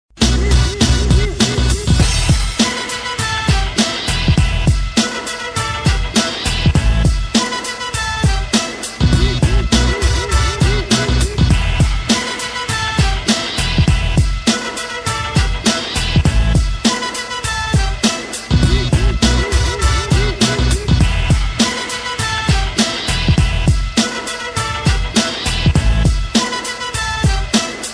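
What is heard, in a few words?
in hip hop style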